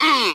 "mmm", Voice; ooh ahh

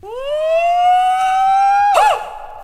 "woo", voice

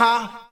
"ha", Voice

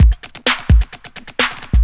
ingrown, Drum loops Electro

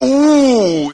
"ooh", voice

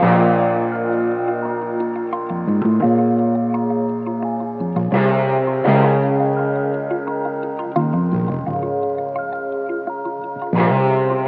guitar, Loops, Phonky

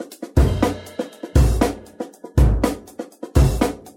quiet kicks, Drum loops Boombap

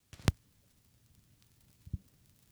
needle drop, Vinyl; Misc Percussion

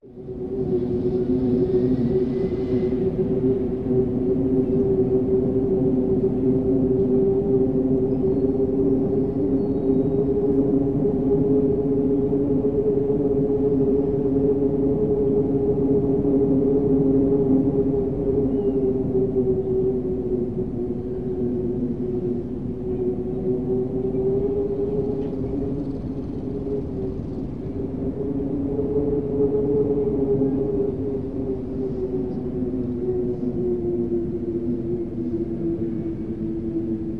roaring winds; Rumbles

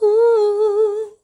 "ooh" SUNG

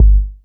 Kicks; Heartbeats

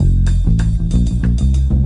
bass, Loops, Phonky

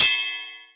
doing, Bells; Misc Percussion

